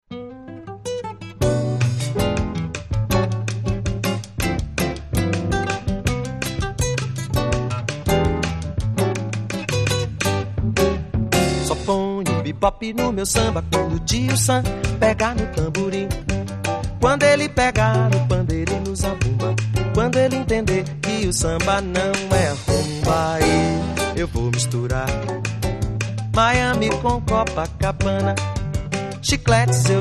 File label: mpb samba